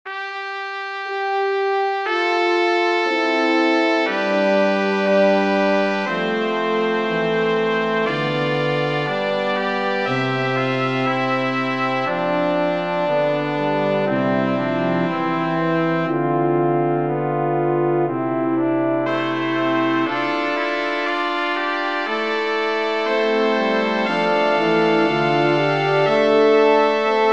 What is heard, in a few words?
canon for brass sextet